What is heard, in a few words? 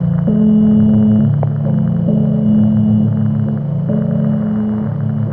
keys krushed, sad loops